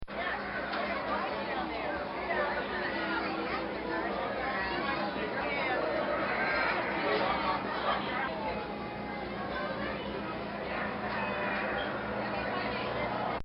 Amusement Park Ambience; Crowds; Backgrounds; Bobby Cole

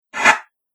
Rough Swipe, whoosh

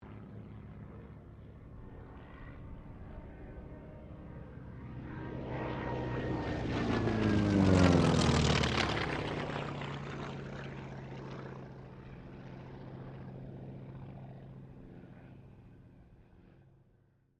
AIRCRAFT T-28D TROJAN PASS; Distant; Airplanes; Vehicles

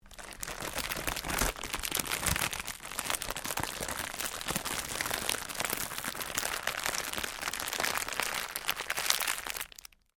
Cellophane crinkle

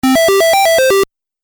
Game Music Phrase, Video Game Sound Effects

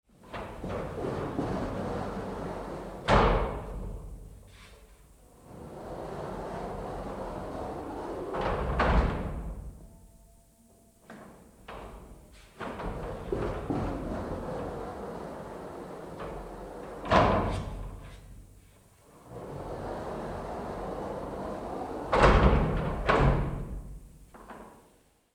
Shower cubical glass doors slide open and close

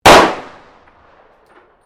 PISTOL TANFOGLIO 38 SUPER FIRING SINGLE

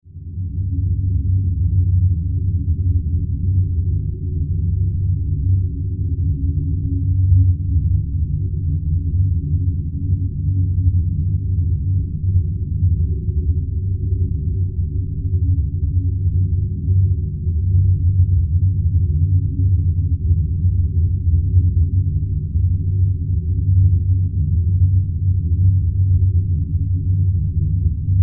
Science Fiction Drone Ethereal; Sci Fi Sound Effects; Sci-Fi (Sound Effects:Science Fiction); SFX Bible 6

